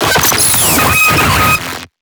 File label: DISTORTED GLITCH; Glitchy Circuits